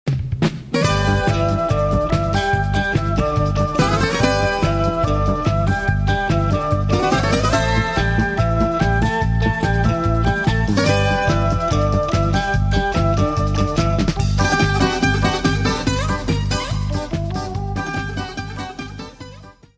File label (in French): PLAY BOUZOUKI - Grece